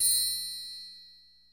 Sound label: Triangle